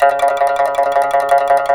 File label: PIPA tremolo C#4; Spectrasonics; Heart of Asia